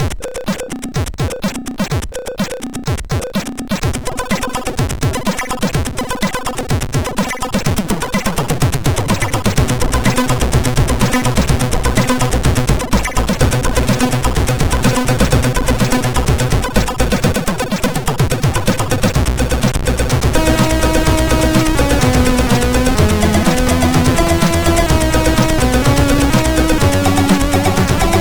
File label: sid MOS6581